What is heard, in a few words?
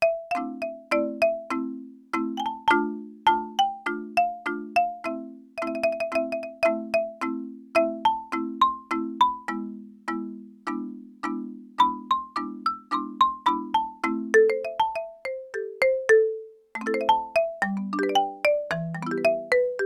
Marimba